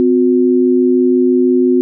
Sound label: bell sounds tritone